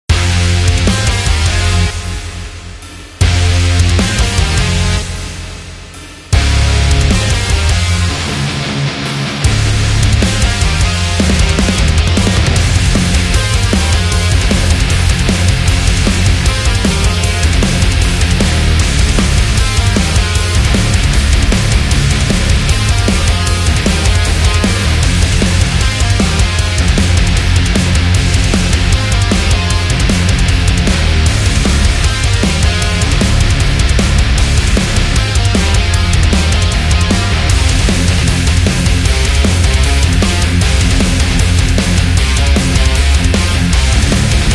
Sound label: Classic Heavy Metal